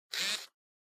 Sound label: Camera Compact Zoom